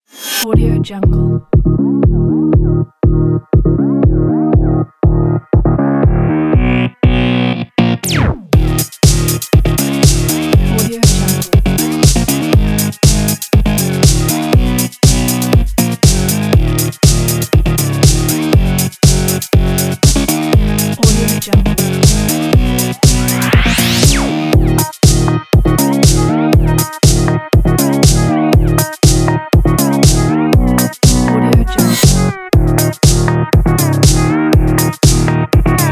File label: energy electronic technology; mark-music